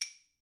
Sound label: claves mp; Idiophones; Struck Idiophones